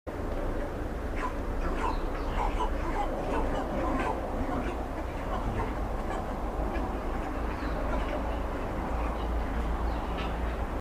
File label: Northern bald ibis; پرنده اکراس سرتاس شمالی